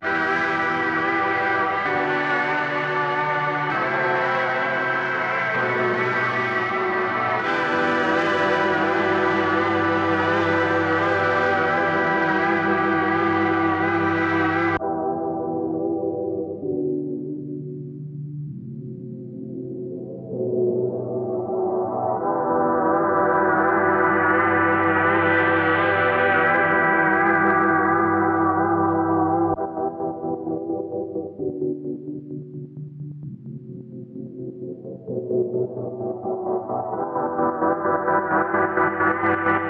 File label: 130 BPM E MINOR